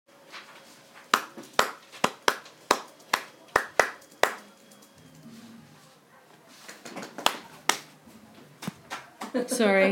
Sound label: rhythm